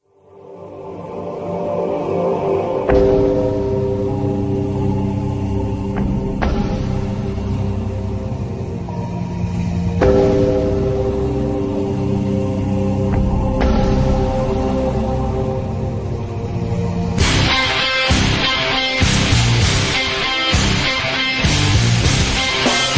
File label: instrumental version, ringtones; halo 2 ost